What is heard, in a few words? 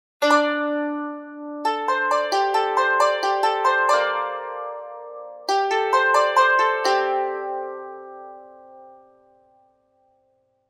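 Alpine Dulcimer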